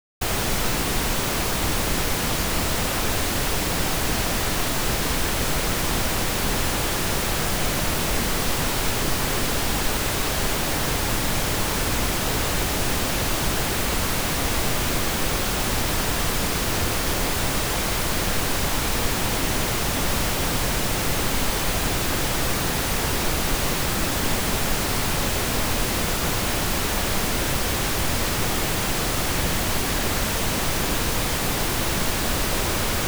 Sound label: pink, pink noise